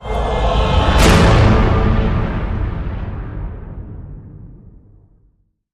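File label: Hit Orchestral Cave Men A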